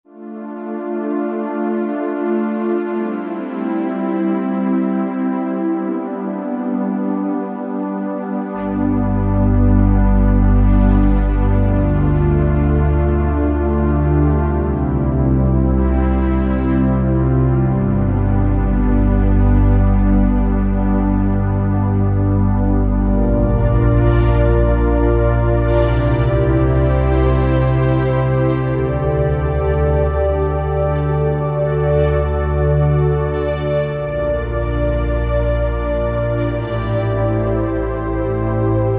JP8 Strings 2, Roland SR-JV80-04 Vintage Synth

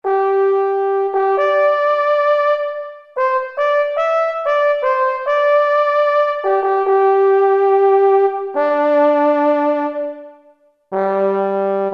Solo Trombone; Kurzweil K2000 Orchestral ROM

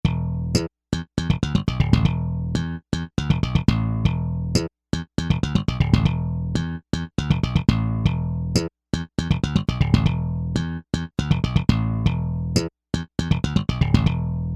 Slap Bass Pattern

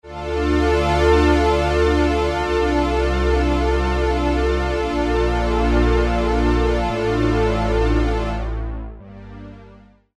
Low Strings, Roland Juno-60; Factory presets; Factory presets group 2

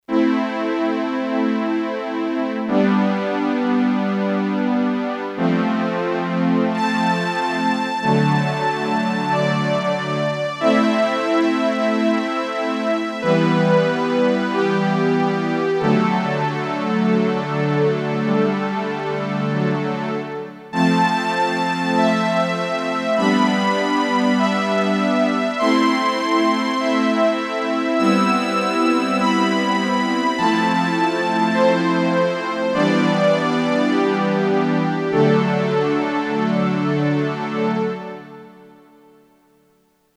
STRINGS 2

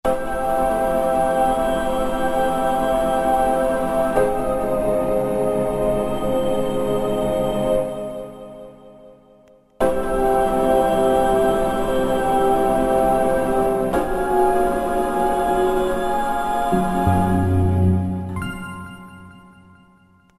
VINYL VOX; Roland SRX-05 Supreme Dance

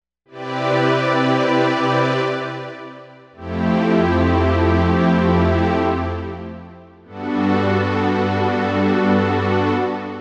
Analog Pad

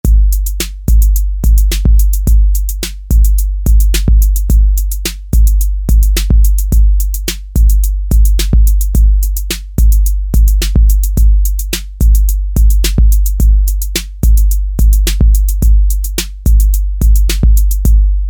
Bouncy Beat, Roland TR-808; Custom rhythms

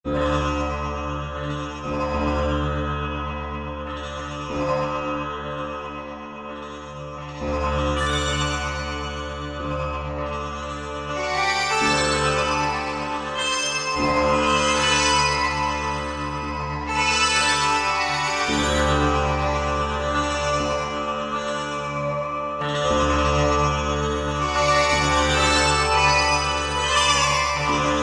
SITAR DANCE, Roland SC-880; Factory Patches

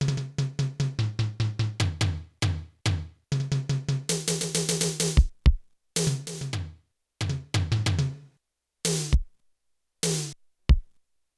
KIT FOUR, Electronic Drums, Yamaha TX16W

